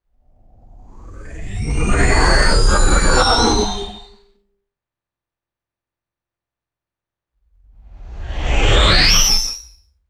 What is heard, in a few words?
synthesized robotic filter sweep whooshes; swooshes